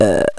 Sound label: burp; The Ultimate House of Games 2000